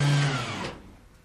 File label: B17 Bomber Bomb Bay Do, b 17 flying fortress